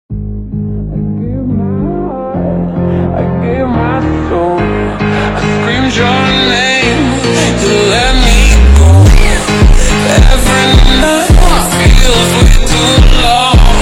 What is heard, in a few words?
funk ultra slowed reverb